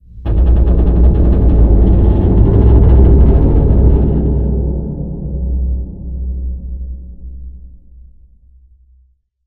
rand bridge groan, ambient; Left 4 Dead 2; Source Engine

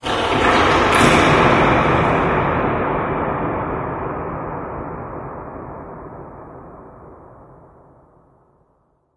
amb rock fall, ambient organic; source engine; portal2

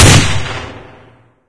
aug, weapons; Source Engine; cstrike

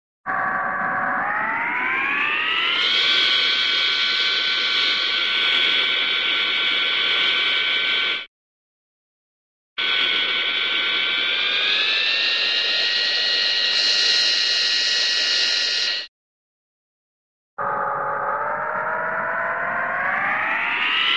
sci fi flying saucer low to medium speed; cartoon

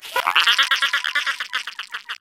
egg devious laugh

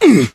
melee swing, player survivor voice

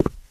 tile, footsteps, survivor, run; player; source engine; left 4 dead 2

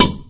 wood solid impact hard

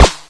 pl gun, weapons; source engine; hl1

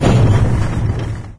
digger stop engine, vehicles; Source Engine; video game

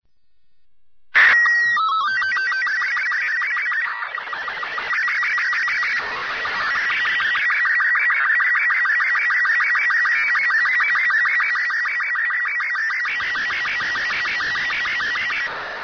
ambient; Portal 2; Source Engine; video games

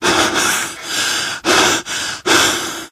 exertion minor, survivor voice, manager; source engine; left 4 dead 2

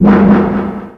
menu enter, ui; Left 4 Dead; Source Engine

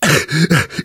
choke; source engine; left 4 dead 2; namvet

